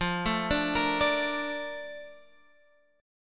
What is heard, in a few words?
Bb Major 7th, F